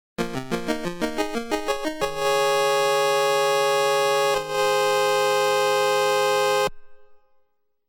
Theme - Fanfare; Kaijuu Densetsu The Tale of the Shell Monsters

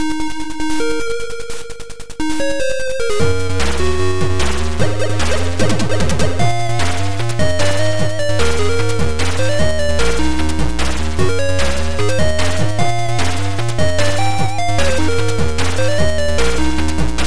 Success; MiG-29M Super Fulcrum; Atari ST

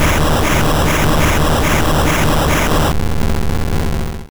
Earthquake Direct; sfx; attack moves; pokemon; gen 1; rby

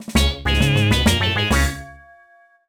BGM Game Over; Rhythm Heaven; NDS

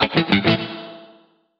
Jingle Round; Clubhouse Games: 51 Worldwide Classics

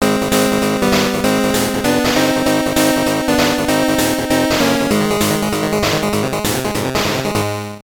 Level Completed & You Win!; Faceball 2000; Game Boy

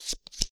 Chose Card

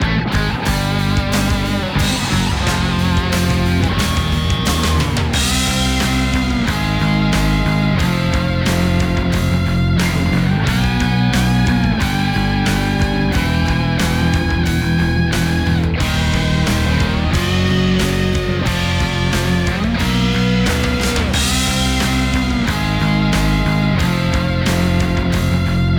Opponent Goal; Mario

